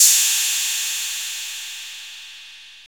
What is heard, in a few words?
808 CR